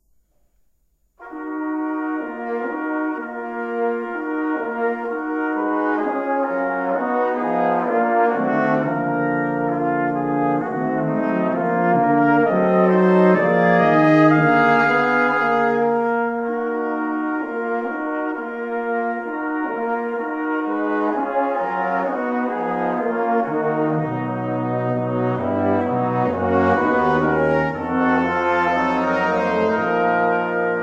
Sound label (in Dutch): Quintet Variatie; Ewald; Quintet 2 opus 6